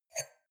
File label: wine glass grab table slide medium; berufe; gastronomie; soundpool